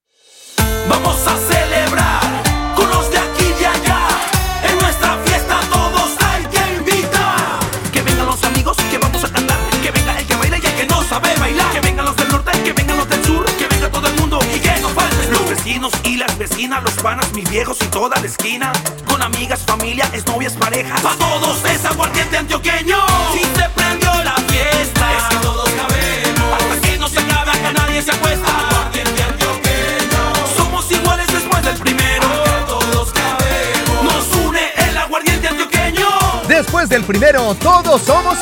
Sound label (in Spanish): Jingle; Aguardiente Antioqueno